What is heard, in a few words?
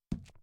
submit